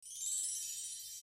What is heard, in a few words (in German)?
zimbeln